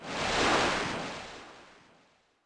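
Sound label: Seashore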